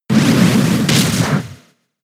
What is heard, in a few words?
Power-Up Punch; pokemon sfx gen 7 attack moves sumo usum